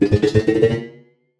Replenish Life Force

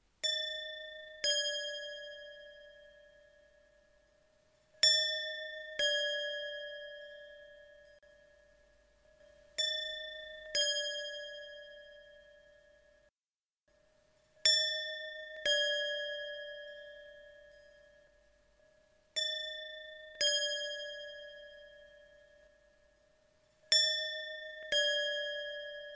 Yin Yang Sound Of Tibetan Silver Bells

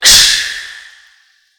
Crash Cymbal

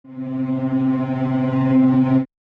Broken Transmission Pads; Spankmyfilth

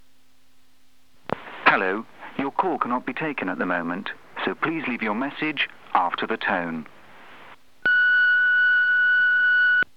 Ans Machine

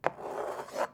Knife Against Wood

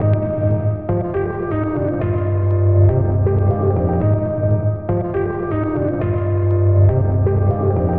Piano Loop

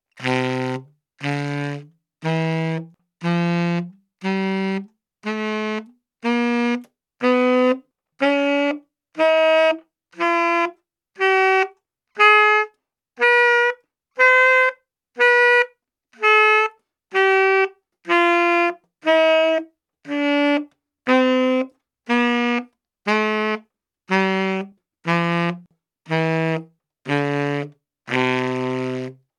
Tenorsax two octaves

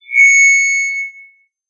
Mic Feedback

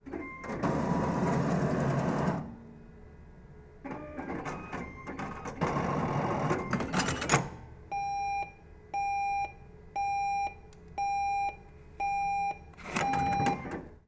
Atm Dispensing Money

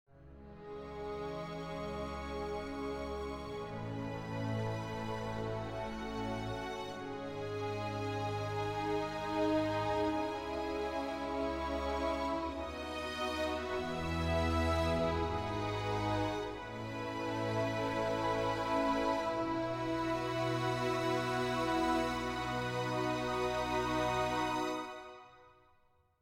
Film Score Soundscape Serene

